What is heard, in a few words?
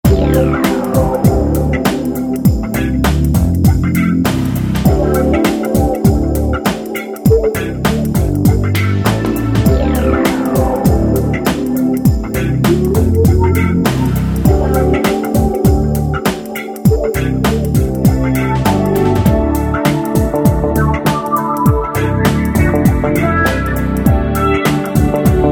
techno